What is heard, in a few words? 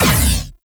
Gatling Laser Shot